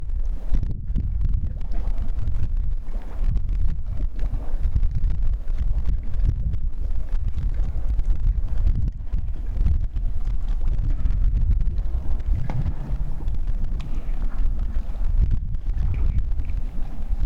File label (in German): Schlammkrater Darvaza